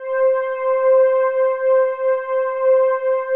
PAD, ATMO PADS; Best Service; XX Large

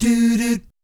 DOO DOOT, Jazz

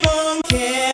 VOX; ZERO-G; Datafile

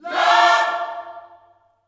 LOVE CHORD, Vocal Gospel

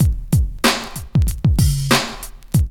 LOOP; E-Lab; X Static Goldmine 2

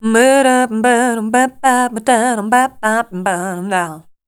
RHY LINE, Voice Spectral; RHYTM

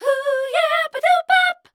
MEL C2; Best Service; Voice Spectral II

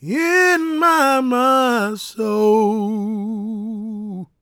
GOSP MALE, Vocal Planet Gospel

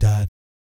"DAT" G#2, vocal jazz fx; Spectrasonics; Vocal Planet Vol.3 Jazz Fx

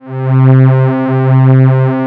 Atmo Pads; Best Service; XX Large

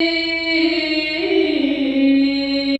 VOC; ZERO-G; Datafile

